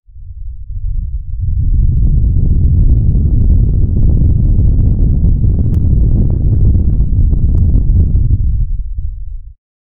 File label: land rumbling from a eart; earth